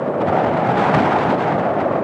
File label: wind